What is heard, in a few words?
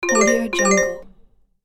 points counter loop